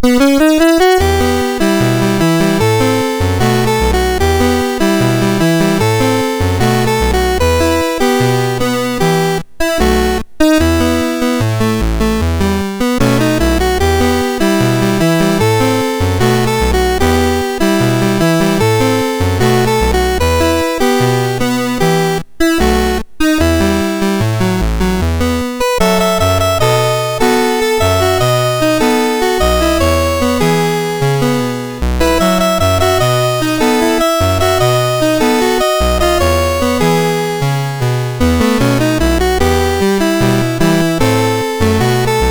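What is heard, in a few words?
Rhumba, MOS 6581 SID chip; MOS6581R3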